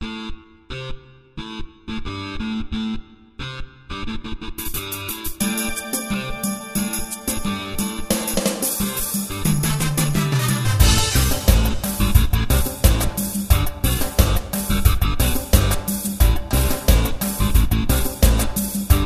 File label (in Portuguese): Berimbau Metalizado Sampler